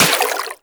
Water Sprayer Beam Impact Flesh

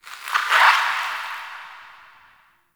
Critter Squeak; Warframe; Ambience; Nokko Colony

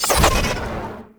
Throw, Glaive; Glaive Ghost Catcher; Warframe; Weapons

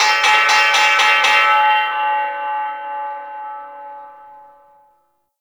Arena Start Bell; Warframe; Missions

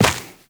Run Dirt, Footsteps; Warframe; Tenno

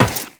Run Metal; Footsteps; Warframe; Tenno

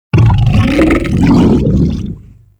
Helminth Feed React Neutral; Liset Alchemy Lab

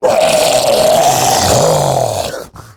Death, Dialog Barks Battle; Warframe; Grineer Ghoul Devourer

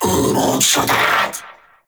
Vocal; Warframe; Uriel; Vythelas

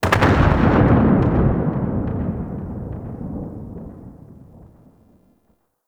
Archwing Space Explosion Dist; Warframe

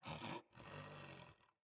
Pet Snore; Enemies; Catbrow; Pet Ship

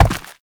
Step Dirt; Warframe; Uriel

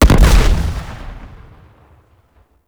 Bow Projectile Explosion; Grineer; Weapons

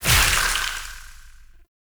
Void Release; Duviri; Incarnon Adapters; Weapons

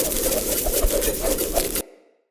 Claw Block Loop; Enemy; Combat; Characters; Corrupted Sproutfolk

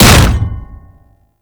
Hammer Impact Flesh; Warframe

